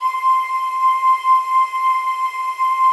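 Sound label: PAD, atmospheric pads; Best Service; XX Large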